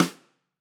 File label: Rim